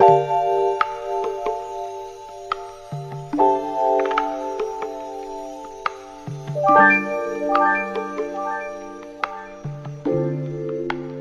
keys, phonky loops

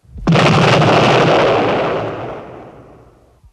explosion; Crash Bang Boom

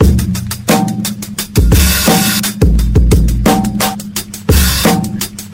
Drum loops, Boombap

